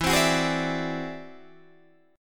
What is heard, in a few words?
E Augmented 9th E down Guitar Open D